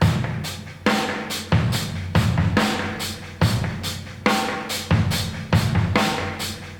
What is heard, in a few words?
71 bpm drum loop sample d sharp key